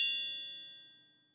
Percussion; Vengeance Samples

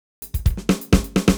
Fill Ins 128BPM; Vengeance Samples; VEH2 Loops

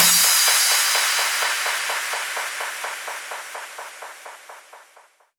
Crash, Cymbals; Vengeance Samples; VEH2

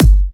Kick 138BPM; Drums & Perc; G#minor

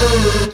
Synths G#; Vengeance Samples

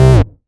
Bass C, Offbeat Bass

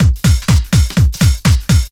drum loop 125, puristic, vocal house; PS-28 Vocal House Samples; drumloops

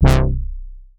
Synth Bass; Vengeance Samples; VEE Synth Shots

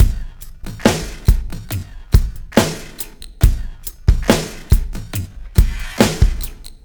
Slomo Beat 140 BPM, Loops, Slow Motion Beats

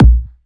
Kick, 128BPM; Shakin Kick

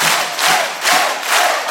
Crowds; FX; Vengeance Samples

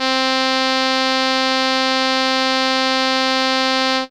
Trumpet C4, ARP Solina Unmodulated; musicradar-synth-samples

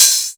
Cymbals HH Open; Vengeance Samples

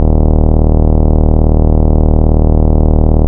Bass D#; Vengeance Samples; Vengeance Ultimate Bass

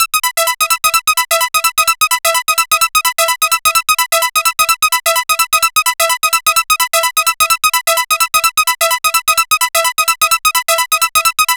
Synth Sequence, 128 BPM; Vengeance Samples; VTDS2 Song Kits

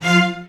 str f, strings; house; PS-28 Vocal House Samples

